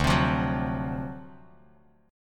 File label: D 7th Sharp 9th, Bass Standard; D7#9 strummed; 10,15,15,11; JGuitar